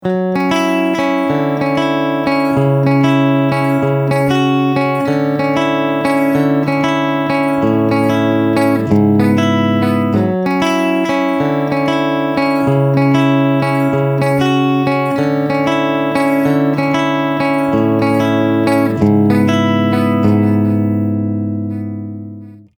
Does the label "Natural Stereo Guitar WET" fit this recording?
Yes